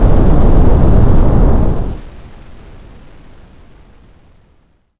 explode

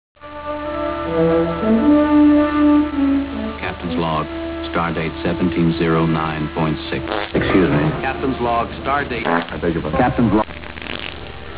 fart; kirk; Star trek; Captain's log

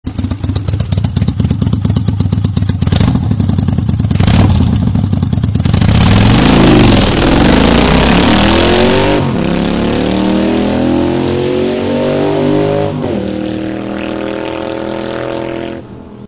viper sound

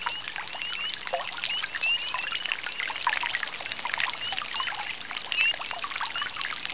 water

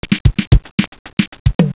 Fast drum

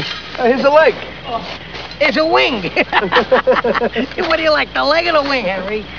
wing

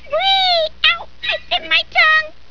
tongue

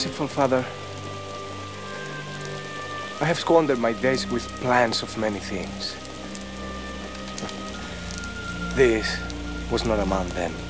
arab prayer

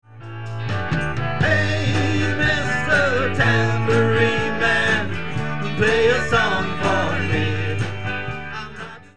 tambourine

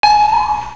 ping; machine that goes ping; monty-python

